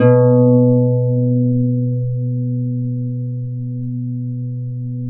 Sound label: B2 HARP; USB Soundscan vol.62; AKAI